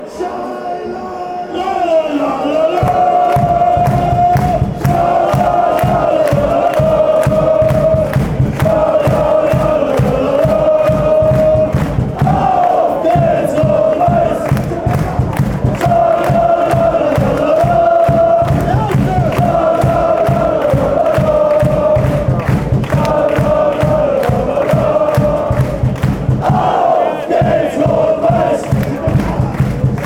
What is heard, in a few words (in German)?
schalalalala auf gehts rot weiss